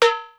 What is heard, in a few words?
Small Trash Can, Yamaha DD-35